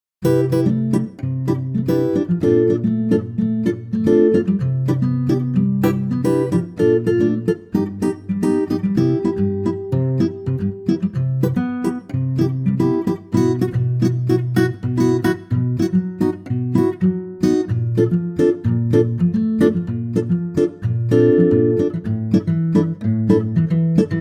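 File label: Acoustic Guitar; key - C (Cm at the bridge); vocal range - G to Eb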